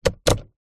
vehicles car wiper stick switch move flick toyota rav4; zapsplat